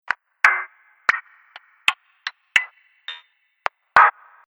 Hit Pack 10 Hits, impact sound effect; Hit Pack 4; Miscellaneous Impact Sound Effects; Andrew Fleming

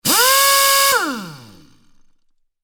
Industry Air Drill Rev; Drills (Sound Effects:Tools)

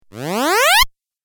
Rising Video Game

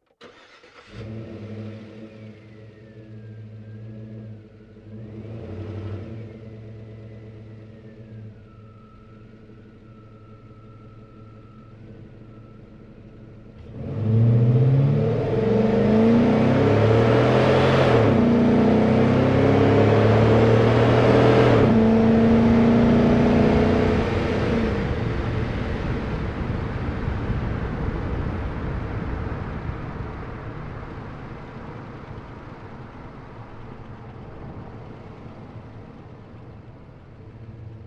Truck GMC Savana Onboard Drive Accel Decel Fast; Trucks; Big Room Sound

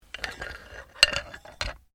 Bones Human Move; Material components for constructing more complex sounds; Tapping; Objects

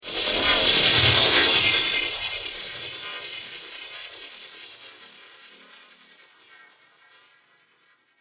Digital, Sweeper, Scifi

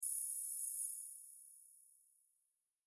Shimmer High Tremolo; High Tone; Blastwave

